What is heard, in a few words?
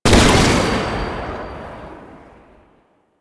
Archgun Charged Shot; Warframe; Archwing